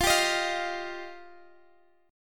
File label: F 7th Suspended 2nd F down; F7sus2; strummed